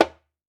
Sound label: WALK DRUM, African Drums